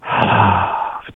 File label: sigh